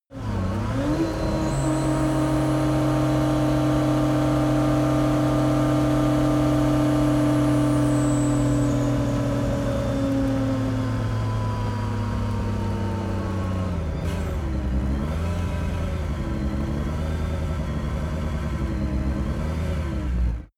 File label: car lancia s4 deceleration high rpm